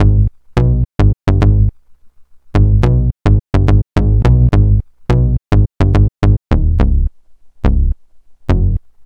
Clipper Bass; Sonic Foundry (Sony Creative Software); Club Joints and Street Anthems; Lions Den

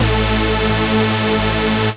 chord A; Techno Trance Essentials; CHORDS

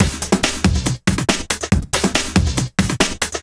Break Beats, 140 BPM

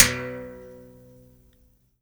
BERIMBAUS; Heart of Africa

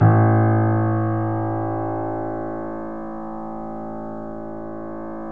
3D Pianos, Boesendorfer; E-MU Producer Series Vol. 5 – 3-D Audio Collection

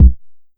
Bass Kick, Jomox Airbase99, Drum Machines

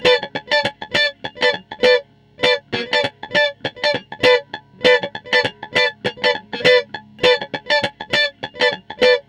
FUNK LICK, Gtr; PlatinumPhattCD2; E-MU Producer Series Vol. 8 – Platinum Phatt

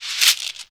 DRAG, Afro Shakers, Shekeres; Roland LCDP14 Africa VOL-2

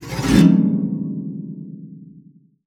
GLISS, SPINET; Xsample VOL-7

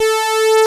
Moog modular; Greytsounds VOL-1

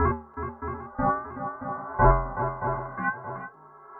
Tape Arp

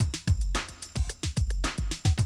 110, Classic Drum Loops

